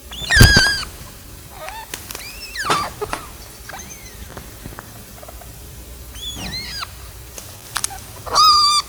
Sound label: agonistic, degu